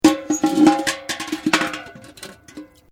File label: Bucket Metal Put Down Scrape; Common bucket; Materials